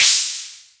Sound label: urban drum crash a sharp key; cymbal drum samples